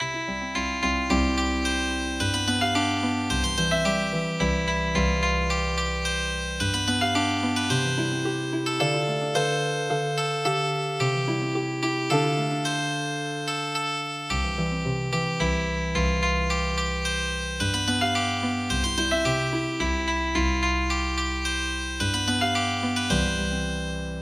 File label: youngman of tirol Healing Harp